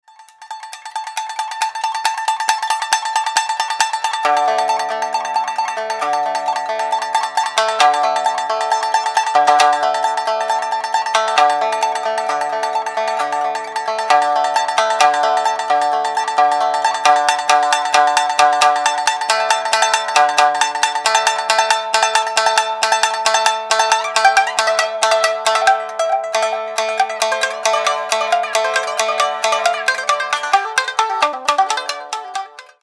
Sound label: cuckoo sample